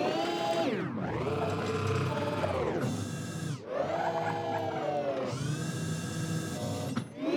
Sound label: robot turn loop